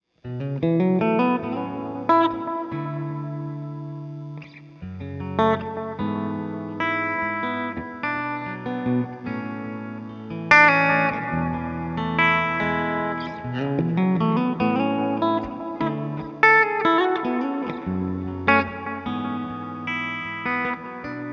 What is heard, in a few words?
Bridge Ambient